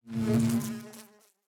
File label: work, beehive; minecraft; block